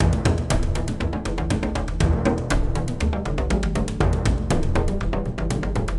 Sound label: sounds music; Halo Combat Evolved; Xbox